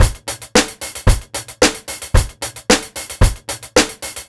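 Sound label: BEAT 2, 112 bpm, Funk; USB Soundscan vol.41; AKAI; Roots Funk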